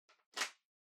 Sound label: Power Strip Far Hand Hard Plug In